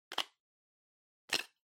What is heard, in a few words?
Spice Mill Open